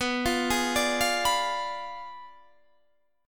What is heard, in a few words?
B Diminished 7th B down Guitar Standard; arpeggiated; JGuitar